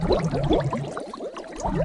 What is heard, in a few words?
Talking Underwater Bubbles